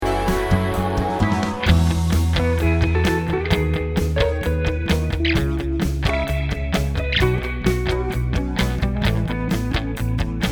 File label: guitar solo